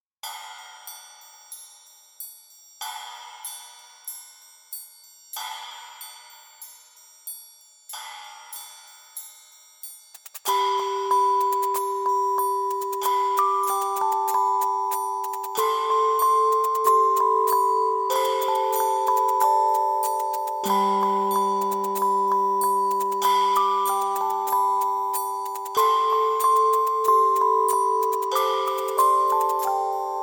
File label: Jingle bells